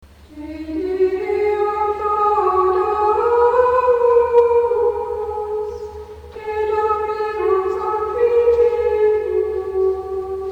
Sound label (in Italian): te deum; vitorchiano